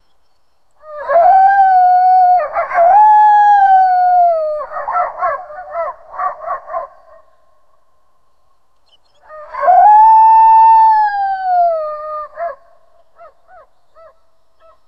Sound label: low quality killer whales